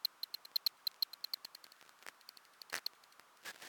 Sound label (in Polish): Karlik większy